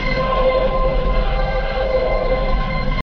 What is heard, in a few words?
Space; Portal